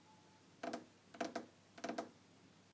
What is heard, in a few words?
Nails On Surface